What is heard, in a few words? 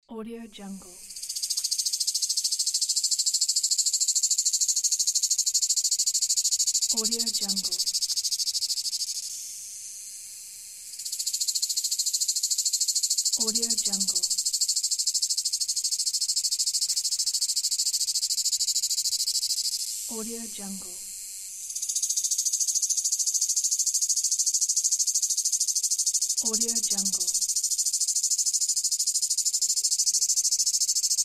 cicada